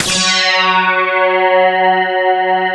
DS8 F#3, Korg; Back In Time Records; Korg Universe VOL-1

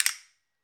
Casta, Clave; Club-50 - Foundations Roland; Wood

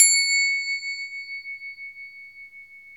NEPAL, Nepal Cym; Club-50 - Foundations Roland; ExotcMetal

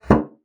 bassoon slap; Xsample VOL-6; C BASSOON

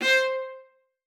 VIOLA SPICC C5; Xsample VOL-5; 90 s Sample CDs

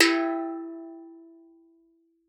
steeldrum E3; Xsample VOL-4

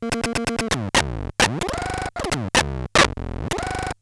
Bent Talking Teacher, Circuit Bent

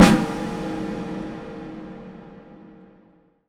Snare Hit, Impact Drum Hits; Sonic Foundry (Sony Creative Software); The Electronic Music Manuscript Collection

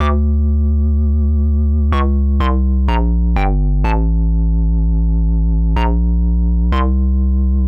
125 Hard Synth Bass D; PowerFX - Nu Jazz House

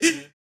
LAUGHS; Spectrasonics; Vocal Planet; Jazz FX

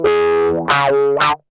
GUI 90 LICKS

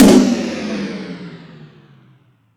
More Toms, Drum Machines

